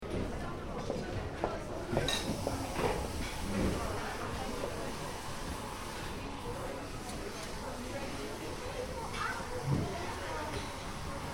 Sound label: Interior cafe; Café, Interior: Ambience; Cafeterias; Stuart Duffield